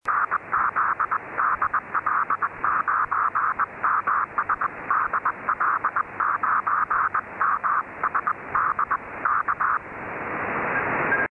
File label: AURORA CW